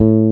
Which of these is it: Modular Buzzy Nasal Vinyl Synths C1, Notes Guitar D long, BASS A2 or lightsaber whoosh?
BASS A2